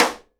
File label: SIDE STICK, Live Snares